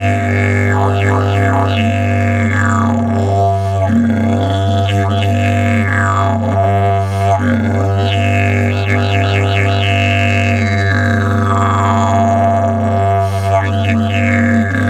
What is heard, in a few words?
G LONG DRONE, Didgeridoo; Big Fish Audio - Didgeridoo and Other Primitive Instruments